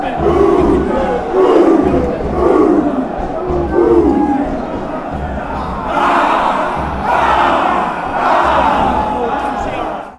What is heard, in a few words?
ooh ooh, fanchants; york